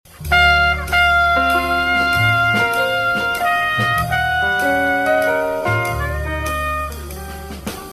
Piano Voicing

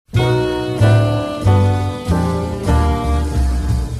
opening chords

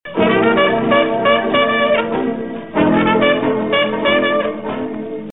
blues

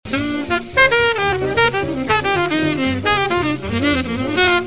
line slow

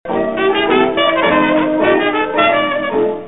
blues chromaticism on V7 chords

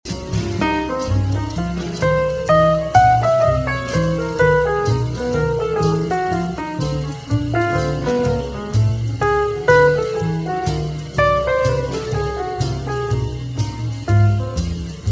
phrase half speed

